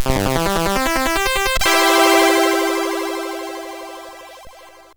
sid CSG8580R5; Vortex Crystals 2017

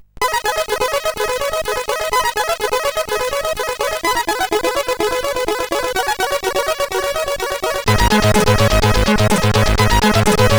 Techno, sid CSG8580R5

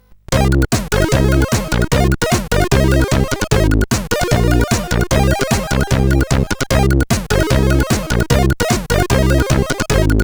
Intro, sid CSG8580R5

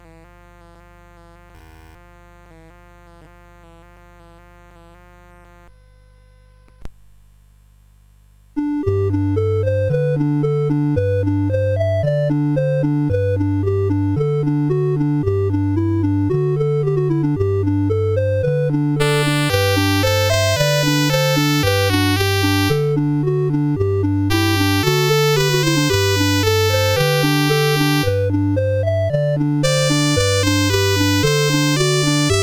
Choralvorspiele, CSG8580R5

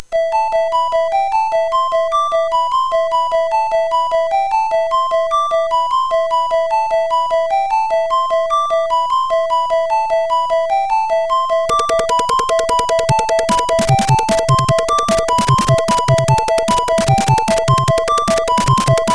Famous Bells, CSG8580R5

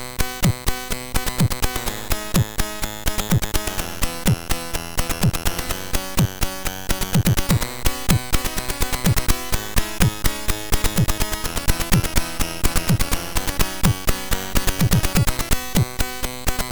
My Amiga, sid MOS6581R4

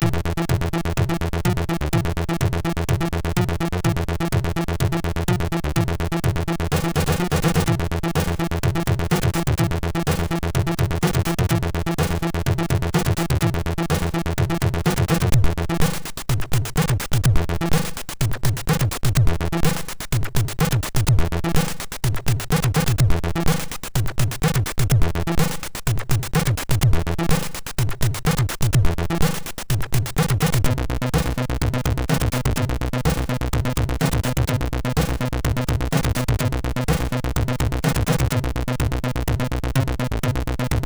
Techno, MOS6581R3

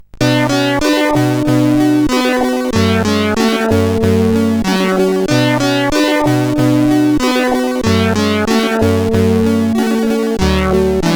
tune sid CSG8580R5; PCX-Show 2 tune 4

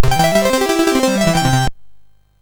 Fire Breath, MOS6581R3

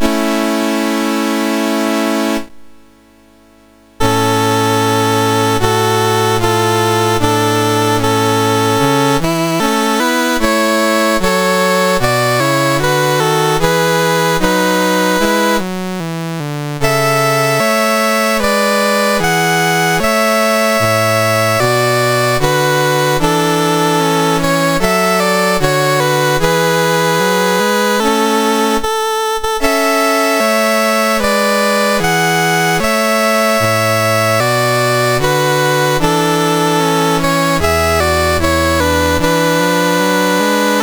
Malta, National Anthems; MOS6581R2; Dave Marquis

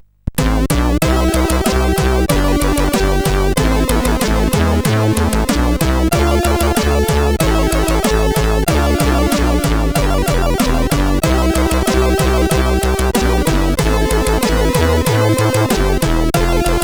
Thunder March, CSG8580R5

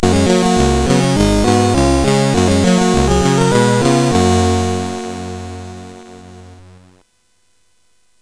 Super Cup Football, CSG8580R5; hvsc

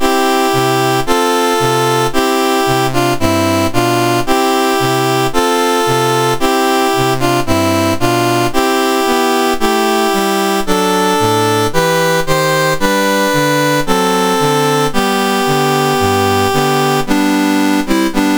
O Sanctissima, MOS6581R2

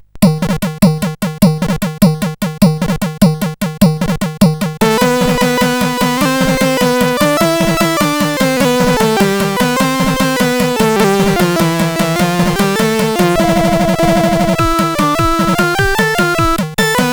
Medieval Song, CSG8580R5

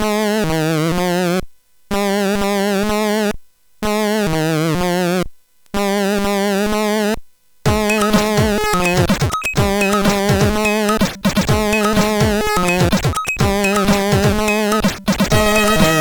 Mix, CSG8580R5; HVSC; Noisy Pill

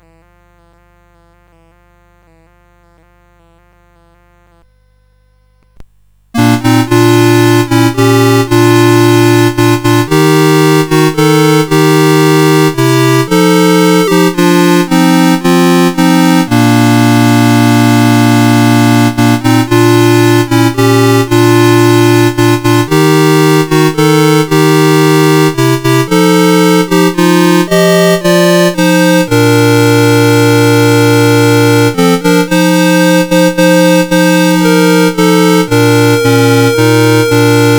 CSG8580; Old Rugged Cross

